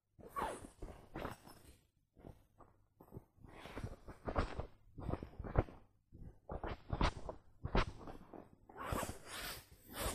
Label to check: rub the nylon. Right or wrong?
Right